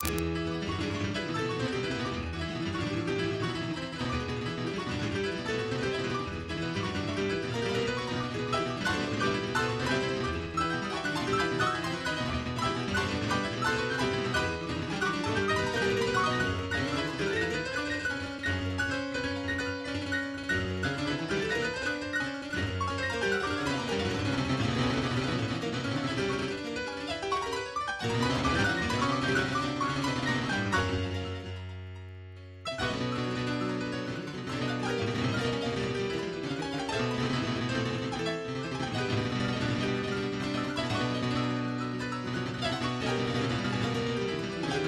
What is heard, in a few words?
Piano Movement for Four Hands